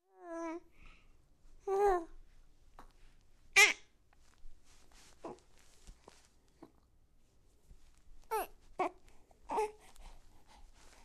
Newborn Baby trying to talk

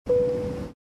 Cabin Seatbelt Notification Ding

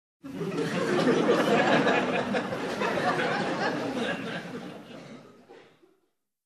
Group of people laughing

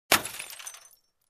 Broken Glass

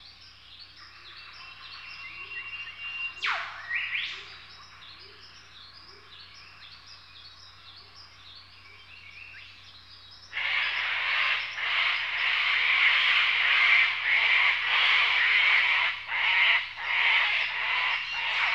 Peaceful Forest with different Birds

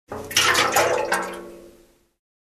Liquid Gas splashing in Canister

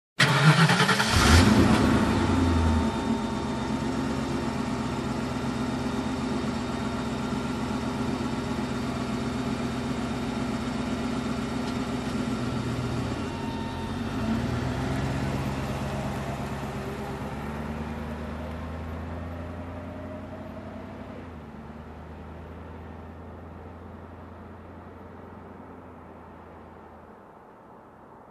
SUV engine ignition

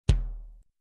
Kick Drum

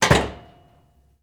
Aluminum Door slam

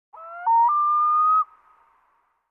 Bird hooting

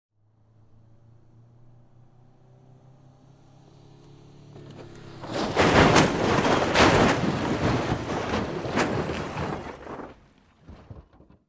Approaching Car Crash

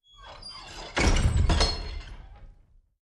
Creaky Steel Door closing

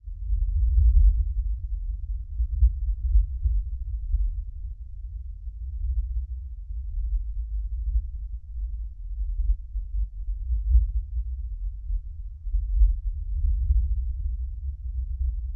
Very deep rumble sound

